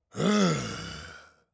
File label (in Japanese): はあぁ…